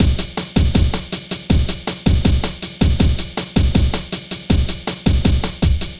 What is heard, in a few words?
Bossa Bass Beat, Electronic Beats; KJ Sawka; Drum n Bass & Beyond